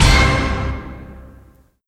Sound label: hit